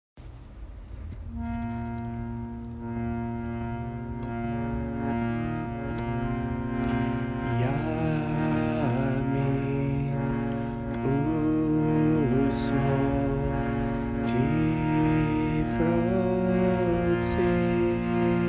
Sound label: chant; yamin